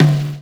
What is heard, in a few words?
SD, snare, 70 s Breakbeats; USB Soundscan vol.46; AKAI